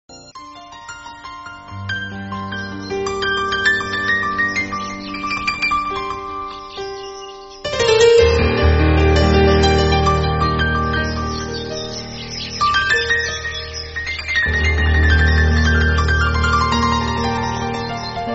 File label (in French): Piano extrait